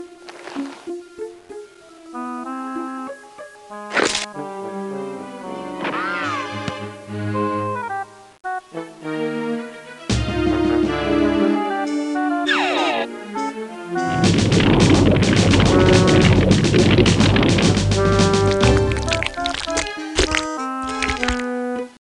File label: Flame; Worms